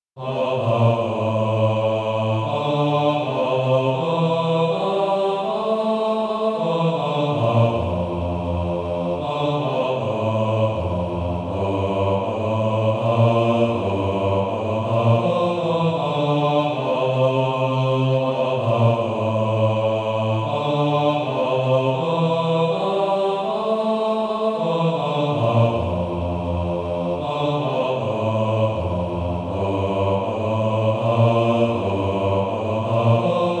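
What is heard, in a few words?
Male Choir TBB 3-part, Practice Music; Bass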